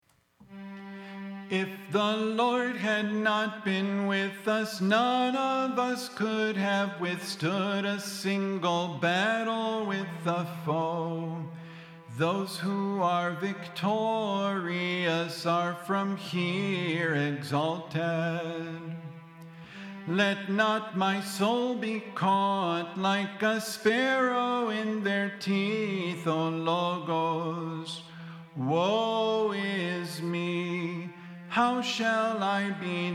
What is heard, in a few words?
antiphon